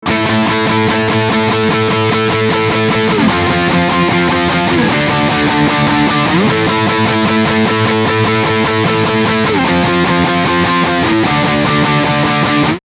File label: NECK drive IV, eclipse active line6